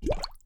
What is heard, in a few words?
bubble